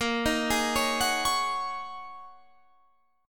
Ab 7th Suspended 2nd Suspended 4th, chord

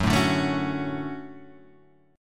D-Major 7th Gb

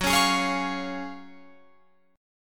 Gb Major 7th Suspended 2nd, Guitar Open F; GbM7sus2 strummed; JGuitar

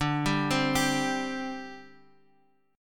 C Suspended 2nd D

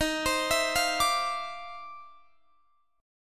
D-Minor 9th, Eb, Guitar, Standard; Dm9/Eb arpeggiated

